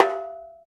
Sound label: Bongo, Roland BK-5; Percussion